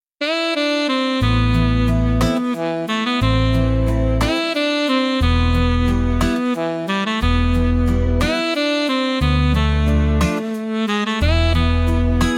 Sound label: sax and soul